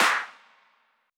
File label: Clap; Vengeance Samples; VEC1 Claps